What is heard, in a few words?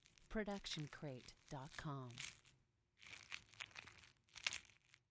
squishy long; soundscrate